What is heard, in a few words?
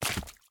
minion footstep dirt; smite; npc; roman archer